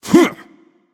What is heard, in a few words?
grunt jump; smite; anhur